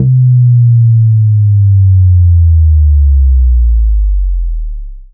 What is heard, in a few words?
Bass Drop